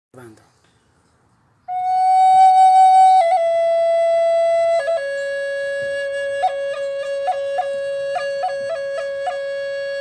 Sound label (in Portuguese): Flauta Nativa Tripla F